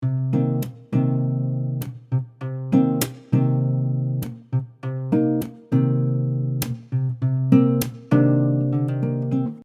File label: Percussive Cmin 100bpm, Soft Nylon Guitar; Percussive Patterns; MG Soft Nylon Guitar - MIDI Pack